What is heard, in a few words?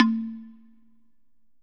slit drum, drums percussion